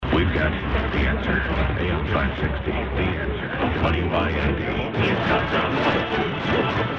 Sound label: wind